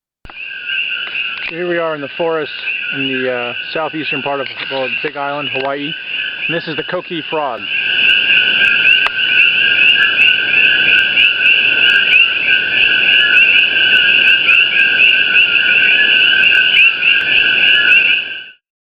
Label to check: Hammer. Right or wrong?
Wrong